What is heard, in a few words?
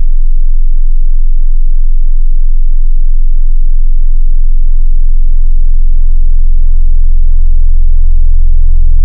Dist Soft Clipping, wavetable